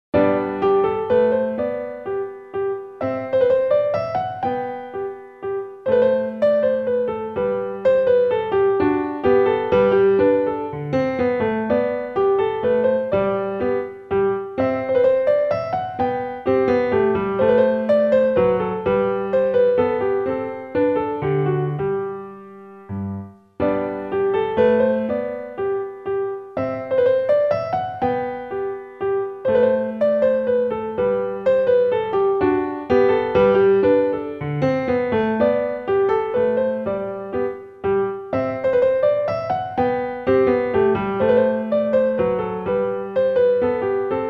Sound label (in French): Menuet en Sol Majeur, piano; Petzold